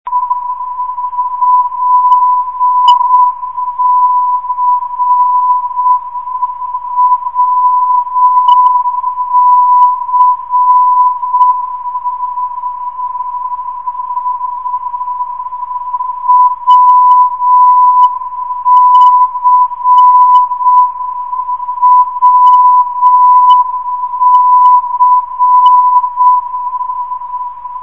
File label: wc 504khz, ndb